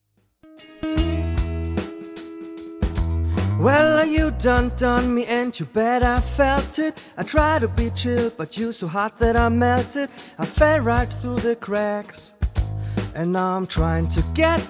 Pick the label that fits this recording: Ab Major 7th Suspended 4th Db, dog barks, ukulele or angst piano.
ukulele